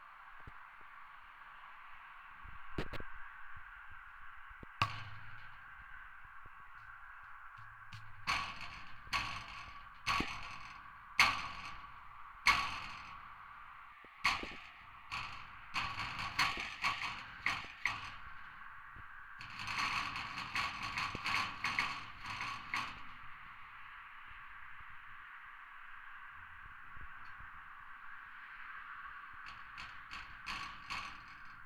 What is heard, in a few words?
Contact Mic on passover; near Moncreiffe Hill; Truscott